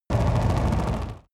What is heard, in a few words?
extrinsic buzz, energie